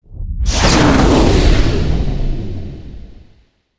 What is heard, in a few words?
Energy Flash